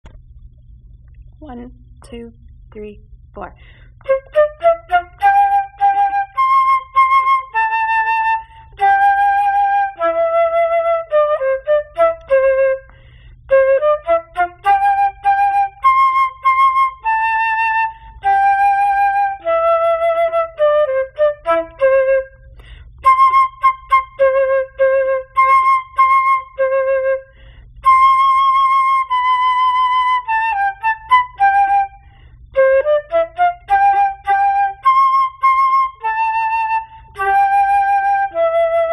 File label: english folk tune part 1